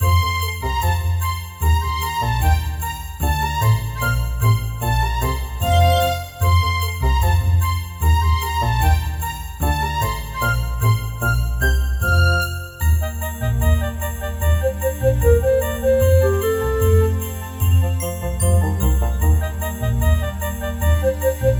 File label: BG MUSIC; Joulukalenteri 2012